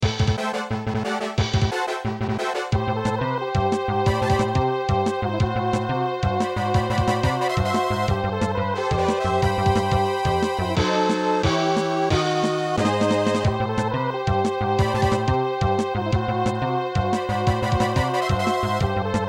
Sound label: battle theme; Breath of Fire